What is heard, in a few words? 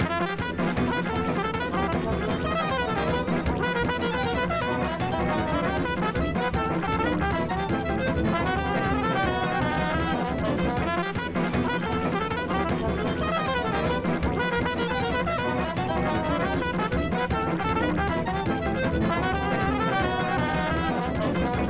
Trot